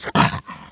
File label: Tiger Bite; Playable Tutorial Level; TR3